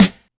snappy snare single hit g key, snare tuned to the G note